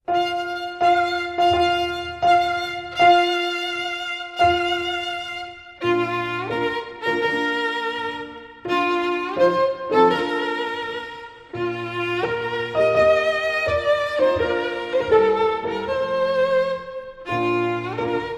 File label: Bridal Chorus (piano and violin)